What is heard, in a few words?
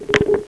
trashing